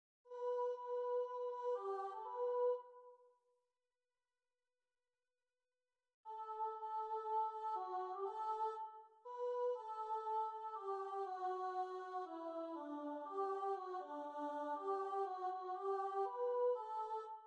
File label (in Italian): coro contralti; Idomeneo